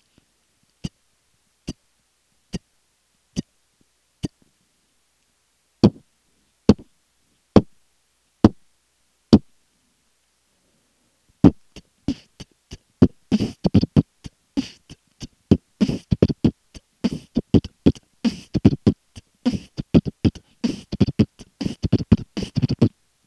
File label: Dry Kick Short Kick Roll, beatbox